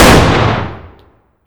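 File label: fire distant 338lm rifle interior; gmod; arccw uc commons attachments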